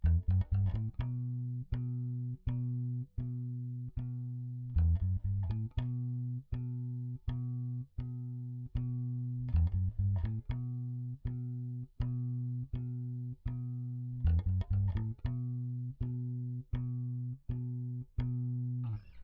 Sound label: bass